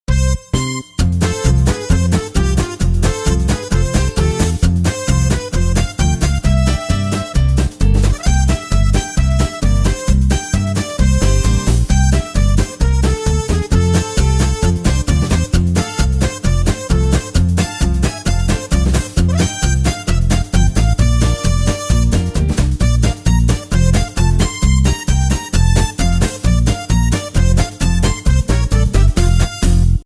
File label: jingle; digital